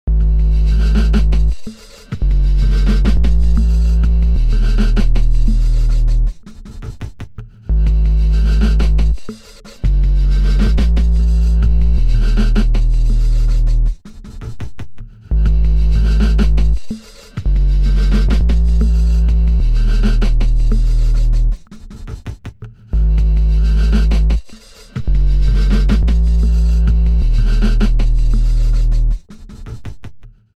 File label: granular